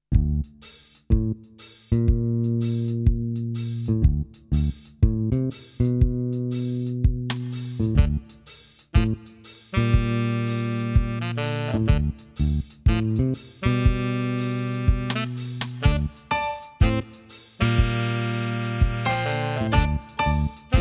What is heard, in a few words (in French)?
ensemble vocal variété jazz chant du monde